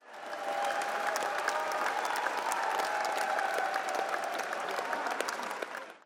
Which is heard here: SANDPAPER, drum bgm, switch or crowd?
crowd